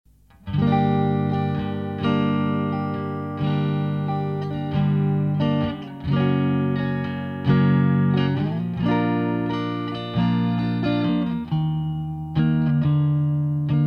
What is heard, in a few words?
Shine Clean